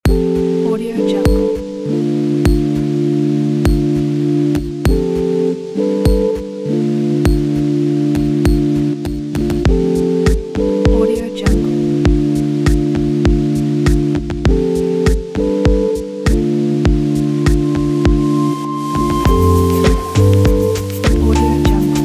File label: lofi chillout